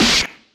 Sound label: arc; Wizardry; ebwizardry